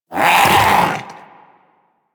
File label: Vocal Attack Short, Hunting Critters; Warframe; Vulpaphyla